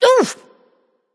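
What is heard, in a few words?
ouch, female; smite; voicepack; hera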